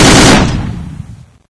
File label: oicw; weapons